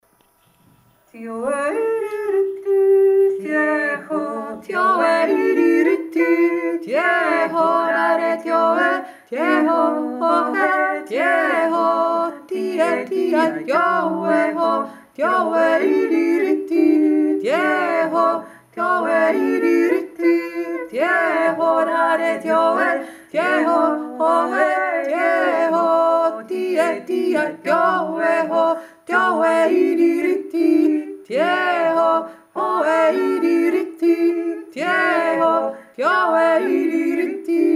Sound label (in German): welliger dreistimmig, jodler